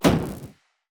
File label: projectile; oracle; Shelter